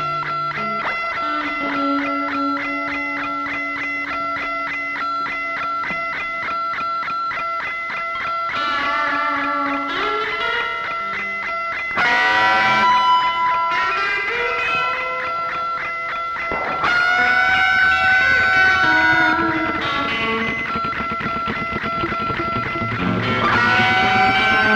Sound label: Inner Guitars